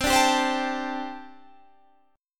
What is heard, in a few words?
G Suspended 2nd Suspended 4th